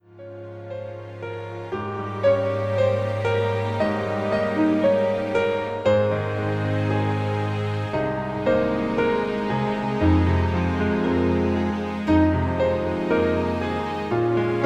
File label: Jingle Bells Key Of A Flat